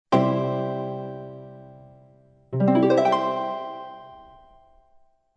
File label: arpeggio